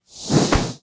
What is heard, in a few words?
boost add fs fly; netent